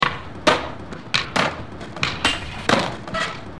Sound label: Skate Break